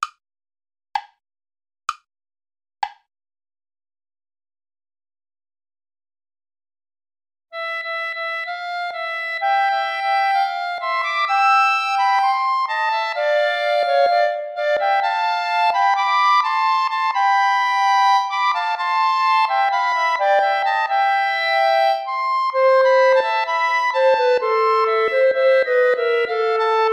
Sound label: tango; albeniz isaac